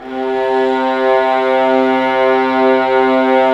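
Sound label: violas; String Master Series; Vlas Sect3-4; Amb mf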